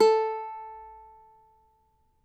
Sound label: CAVAQUINHO; Spectrasonics; Hans Zimmer Guitars VOL-2